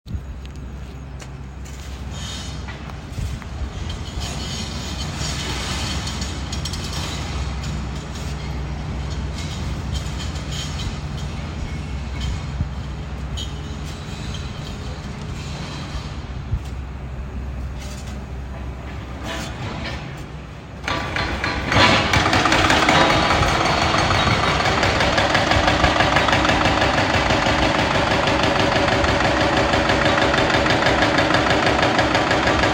City Construction site, Rotterdam Field Recordings